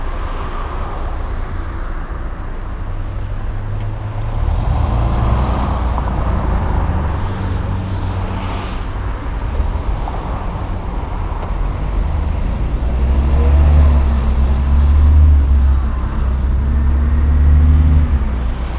Crossroad noise binaural; TS 103 224 Background Noise Database

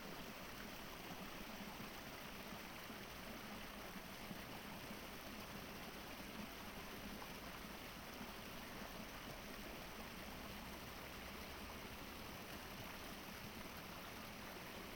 Nature Creek binaural

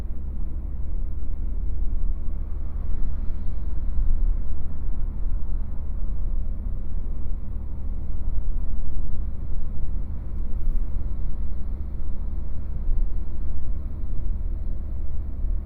Fullsize Car 80 Kmh binaural, Background noise

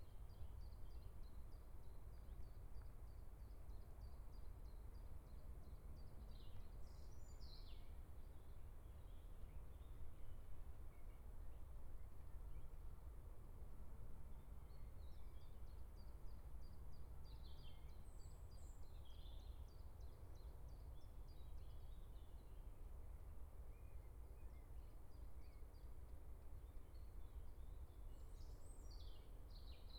Nature Open Field Noise binaural

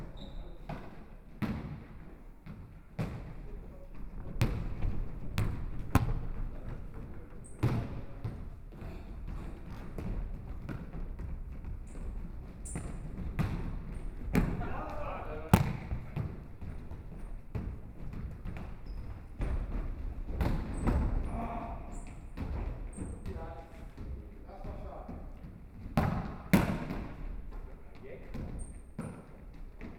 Sports Noise Indoor Soccer binaural

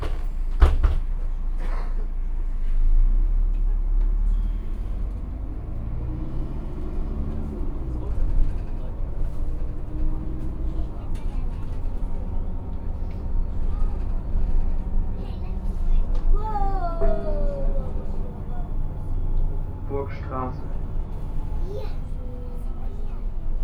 Inside Bus, Binaural, Background Noise; TS 103 224 Background Noise Database

